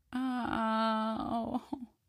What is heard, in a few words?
moan, female; npc; Garry's Mod; hl2